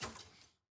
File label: ui generic section microfiche click